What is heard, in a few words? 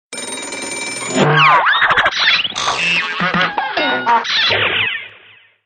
cartoon wake up